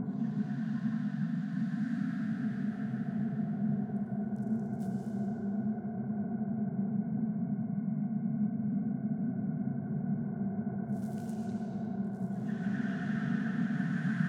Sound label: tomb, ambient; Fate The Cursed King